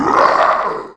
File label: death; Alien Arena; xenoid